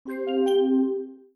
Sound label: Welcome Sound, Notification; Mac; microsoft office mac